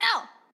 Hurt; Jackie

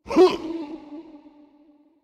Effort Overhead

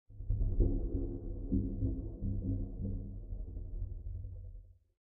Construction Underground; lethal company

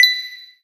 Enemy Train Light On

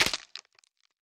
Break Bone

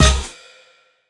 Ladder Progress Step; Trials

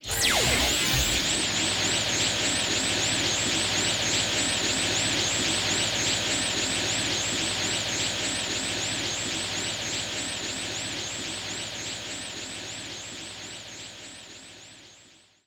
Spin Blade Loop; gamerip; disney star smash; android

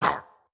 Chop Attack; Caffiend Caelan

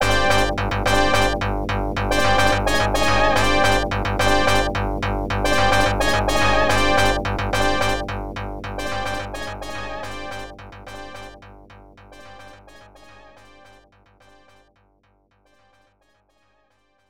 Opening BGM

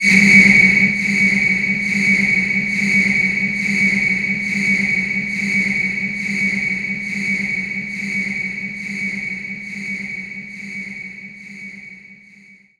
Dark Lp; SE Manzai#20 (SE128 Man Dark Lp)

